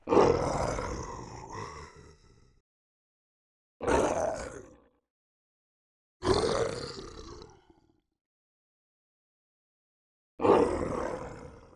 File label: Death